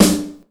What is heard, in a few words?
2000s trebly acoustic snare sound g key; Duration 0:01; snare drum samples